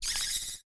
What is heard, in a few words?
lightning bug hurt